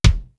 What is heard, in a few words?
CHUNKY, TOM; East West - Global Sounds; D4 BD SD TOM